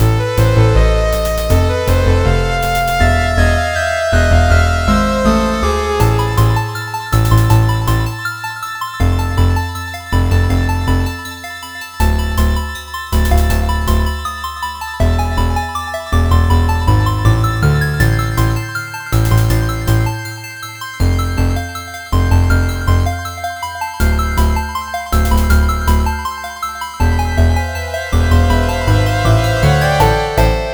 Dungeon; Twin Blades of the Three Kingdoms

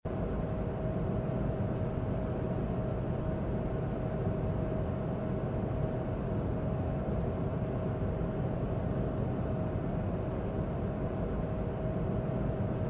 Main Halls Ambience; escape the backrooms; Level 5

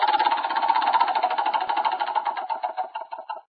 Minigame Spinwheel; Papa's Cupcakeria

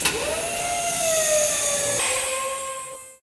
Horn Drill; Pokemon Reborn E19

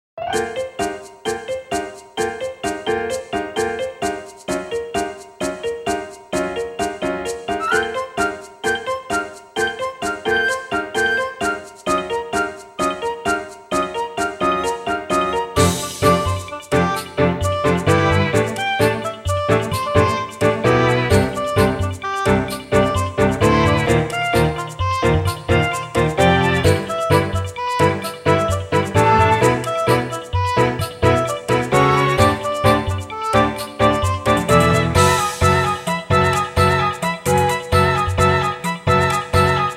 change of rhythm; PlayOnline Viewer

